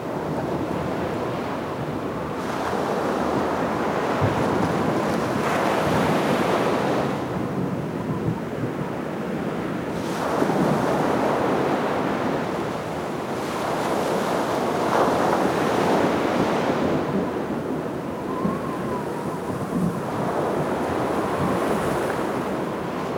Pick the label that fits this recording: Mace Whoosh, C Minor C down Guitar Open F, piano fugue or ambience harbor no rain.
ambience harbor no rain